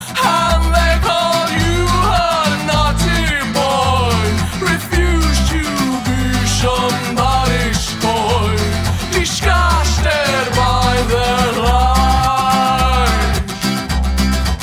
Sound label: Ringtone